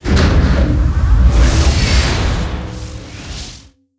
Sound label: Big Laser; blue archive